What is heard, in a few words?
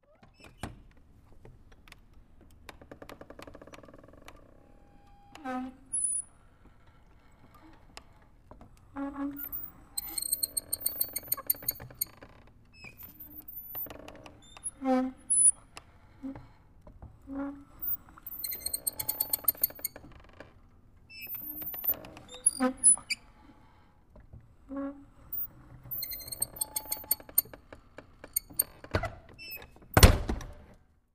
Metal Wood Door Open, squeak door